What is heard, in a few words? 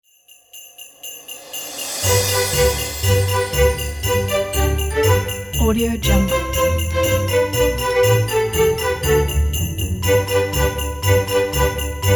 jingle bells